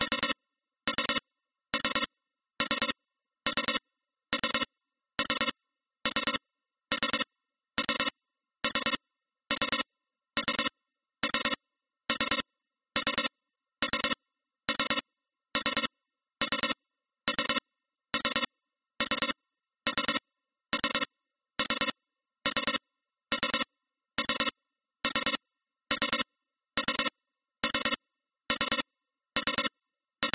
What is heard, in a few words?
science fiction alarm digital tone 3 cluster medium